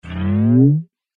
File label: Space Metal Sound, science fiction sound effects